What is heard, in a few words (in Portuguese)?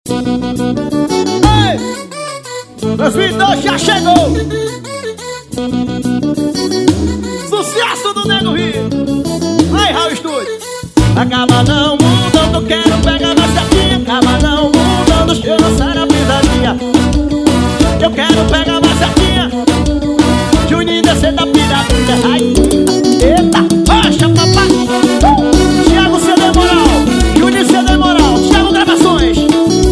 forro